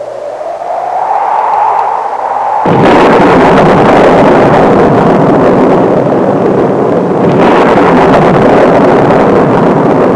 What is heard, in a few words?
wind and rain; BSA Sea Scouts and Explorers